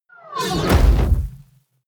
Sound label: fire whoosh sci-fi small